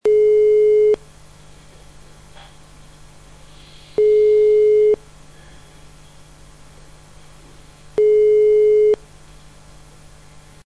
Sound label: signal